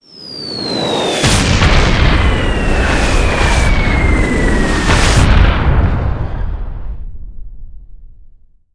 Tiếng bom nổ

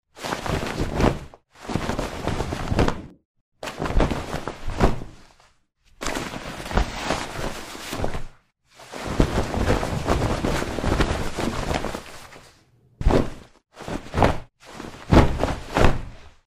Tieng mac ao choang khoac ao khoac

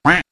tieng vit keu; meme

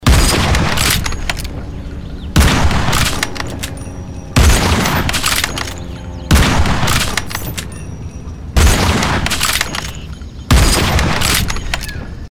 Tieng sung ban

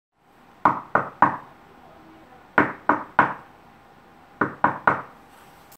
tieng go bang

